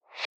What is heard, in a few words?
Swipe